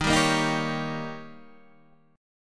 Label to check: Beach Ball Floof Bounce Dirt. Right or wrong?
Wrong